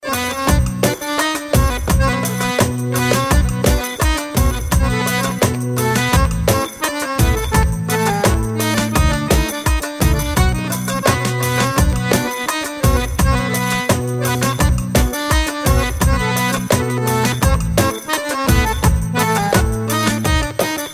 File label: Accordion Balady